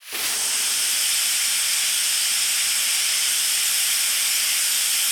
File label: Su-27 cockpit canopy close cockpit, aircraft; DCS